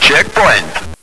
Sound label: checkpoint